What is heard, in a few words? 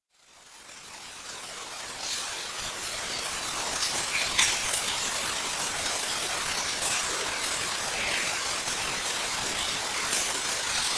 rain